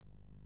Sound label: boot